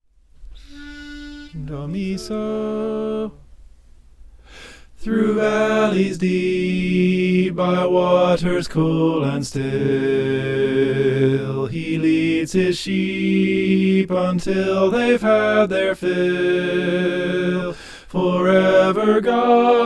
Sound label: trusting the shepherd soprano